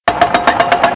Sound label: Base Claw Winch Up Loop; Playable Tutorial Level